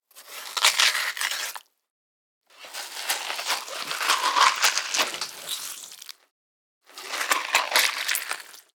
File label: Flesh Cut Watermelon; Gore Toolkit HD; Soundopolis